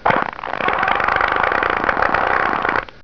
chair; Chair Fart 2

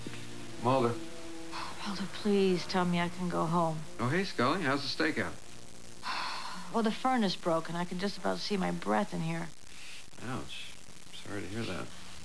breath

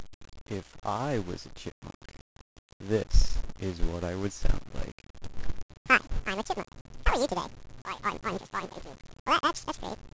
chipmunk

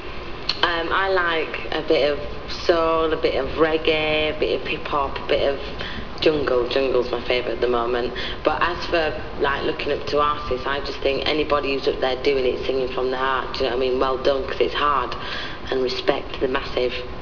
music

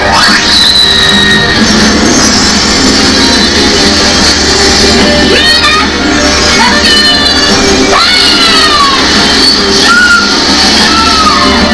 chain

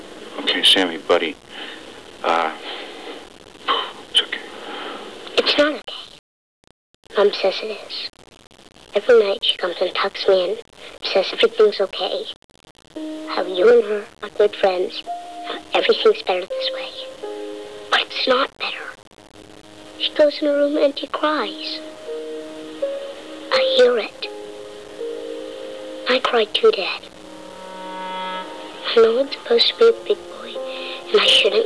cry

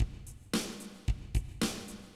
like stone beat

